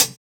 Closed Hat, JUNGLE BREAKS

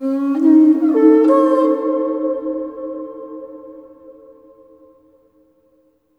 HRNPHR, Heart of Africa; AMB. HRN FX

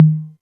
tom mid, Novation Drumstation

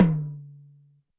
Tom Lo-Fi, YAMAHA RM1X

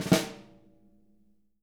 snare ruffs, JZ XSNARES; Sampleheads; Peter Erskine Living Drums

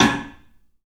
CLUB X STICK; 3D Sound; 3D Drums

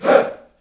"HEY", Heart of Africa, shout

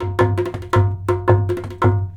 DHOL, Indian Traditions; USB Soundscan vol.45; AKAI

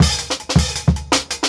160, Jungle Breaks; Original Jungle Breaks

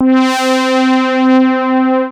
MOOG BASS C4; Best Service Dream Experience

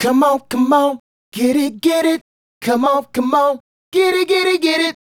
Male Group, Hip-Hop and RnB Vocals

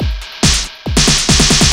Noisetech fill, Drums Beats

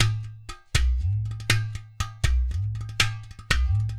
drm, 120, MIXED DRUM LOOPS, WORLD DRUMS

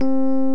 Electric Pianos

